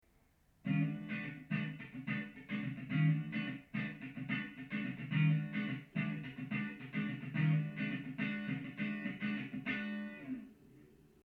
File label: clean